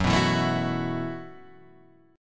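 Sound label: B 7th Suspended 4th G flat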